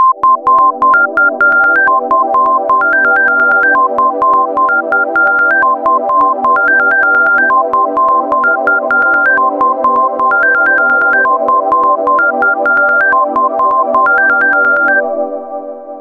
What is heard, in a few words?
Beep Pad Ringtone